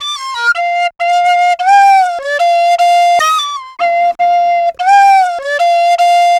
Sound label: Penny 075bpm Bb, Penny Whistle, African; Quazibeat; African Secret